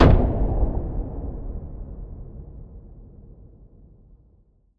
Impact Boom Thud; bottlerocket

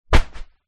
household bean bag foot stool drop on hard floor

